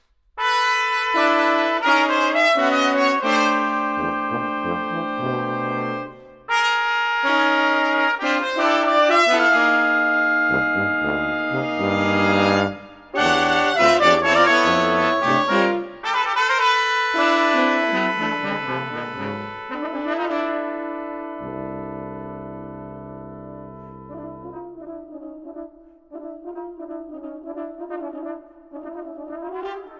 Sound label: Brass Quintet